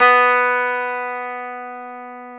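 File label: guitar electric 12 string; Club-50 - Foundations Roland; E.12 String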